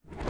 Footstep Plastic-Tech Rev; viewfinder

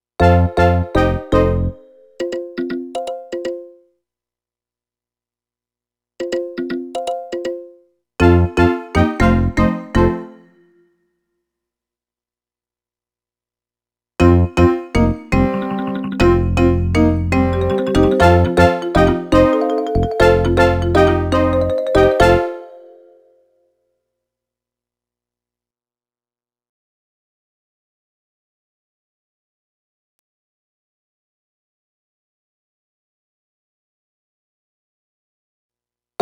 music beach bpm120; Donkey Kong Country Returns HD

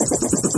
Chopper; Go, Kizi, Go!

